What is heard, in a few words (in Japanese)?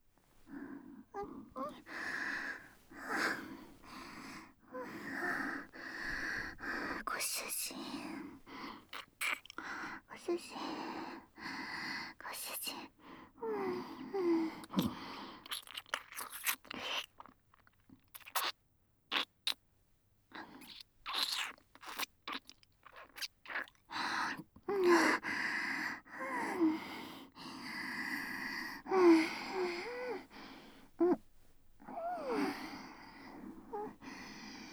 お布団の中で糖度MAX超密着いちゃいちゃベロチュー騎乗位えっち